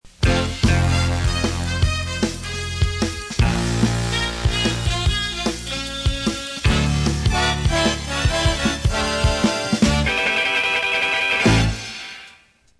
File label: bass bone studio